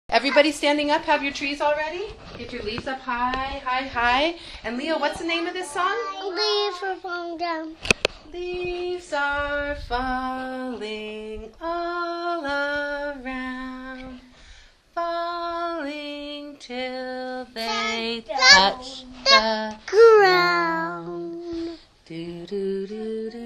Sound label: leaves are falling